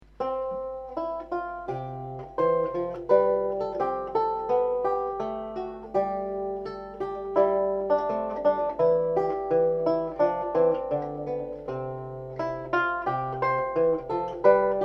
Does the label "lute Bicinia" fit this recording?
Yes